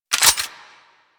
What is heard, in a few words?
Quill Dart Gun Reload; Warframe